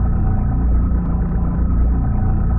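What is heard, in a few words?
engine run decimator loop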